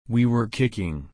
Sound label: kick